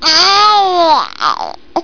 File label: baby